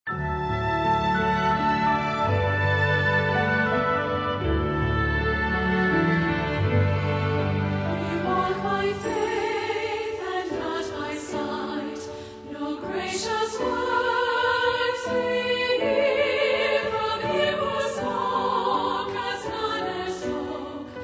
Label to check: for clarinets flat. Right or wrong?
Wrong